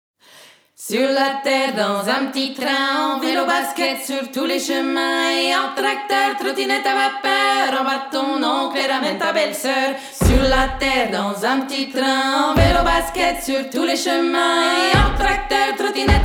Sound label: Jingle; terre